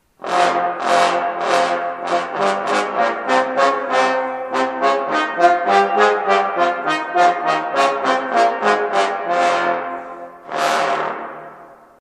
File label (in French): basse